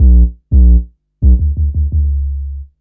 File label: Raw Bass, Drum & Bass; Fluid Dynamics